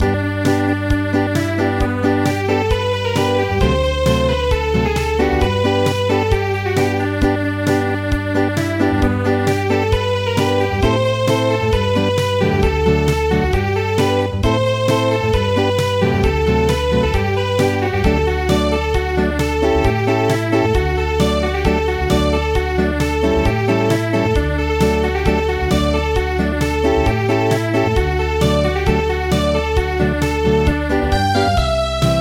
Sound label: Polka Acoustic; midi; traditional